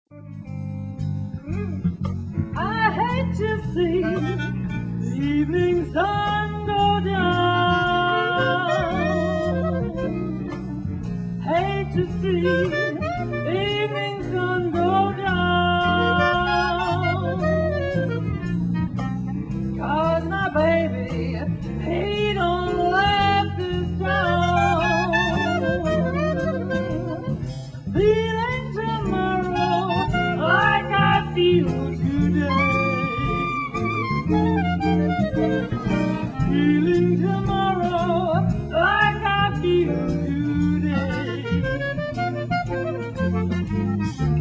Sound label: blues